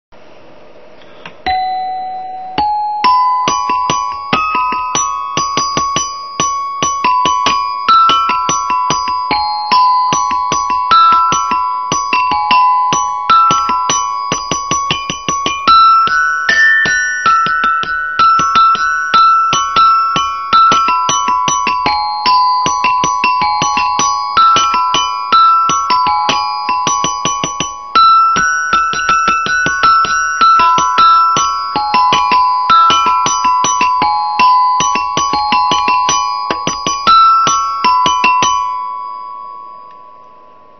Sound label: Rock